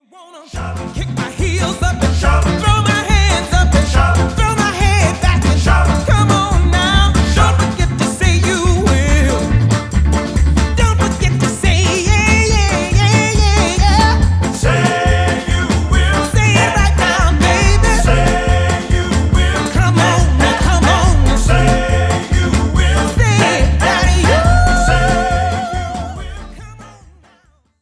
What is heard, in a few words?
Shortloop Shout; Just Dance Kids 2014; Wii, Wii U, Xbox 360